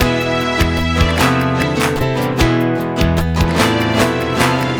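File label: traditional, ringtones; Seville; Google; NewWave Labs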